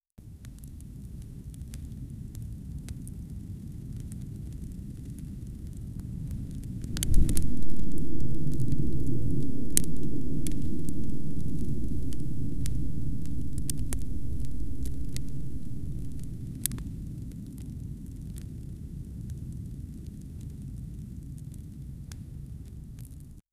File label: soft rain or ember, ethereal